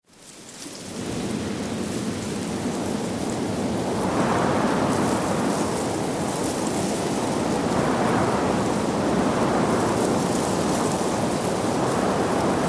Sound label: WEATHER - WIND TREES & GUSTS: Soft wind with rustling leaves.